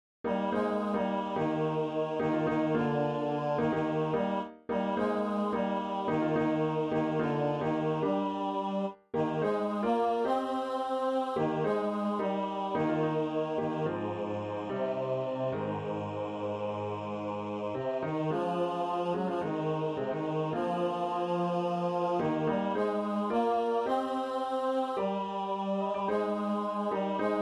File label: bass 1 Eb; Fellowships – Bass 1 practice file